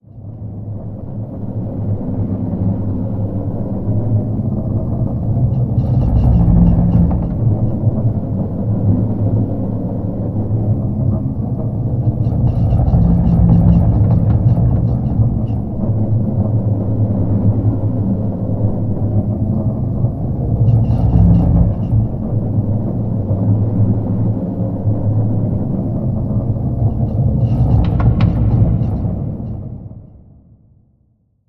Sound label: Rolling Ball Dark Atmosphere